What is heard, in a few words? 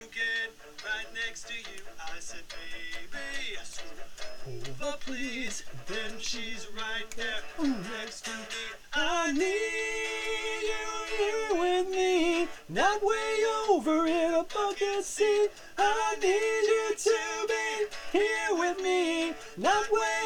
Harmonies, acappella